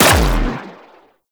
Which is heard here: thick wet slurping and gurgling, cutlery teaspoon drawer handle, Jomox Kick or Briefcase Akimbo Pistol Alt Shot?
Briefcase Akimbo Pistol Alt Shot